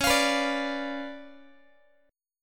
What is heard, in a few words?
C sharp 7th Flat 9th, Guitar; C#7b9; strummed; JGuitar